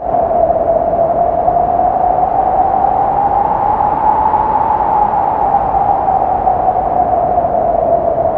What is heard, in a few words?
SYNTH WIND